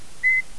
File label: short whistle